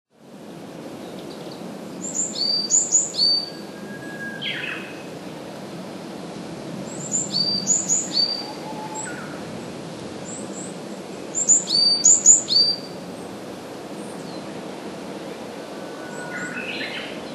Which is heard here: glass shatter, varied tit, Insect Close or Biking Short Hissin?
varied tit